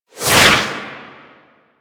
Tank Missile Barrage Whizzby; Enemies; Abilities; Warframe; Lasrian

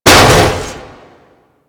Frame Step E; DE; Warframe; Vay Hek

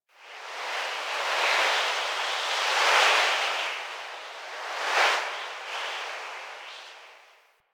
Wind Gust, Ambience Plains; Warframe; Eidolon Plains; One Offs

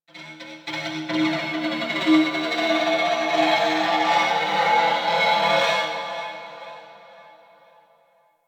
Crp Ship Energy Moan Texture Med; Ambience; Warframe; Corpus Ship Remaster

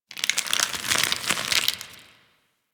Ice Crackle Amb

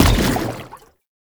Step Default, Footsteps

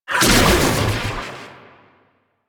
Archon Whip Whoosh; Enemies; Weapons; Warframe; The New War; Nira

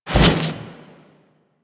Melee Jump Slam Large Charge, Whooshes; Weapons; Warframe

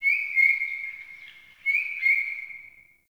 Wetland Forest Day Bird Distant; Ambience

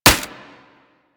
Primary Initial Mech, Weapons; Sapient

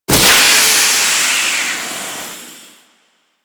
Steam Damage Hit; Warframe

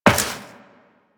Step Metal Perforated, Footsteps Walk; Warframe; Tenno

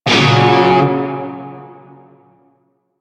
Shawzin Hirajoshi Chord H; Music; Warframe; Narmer Shawzin

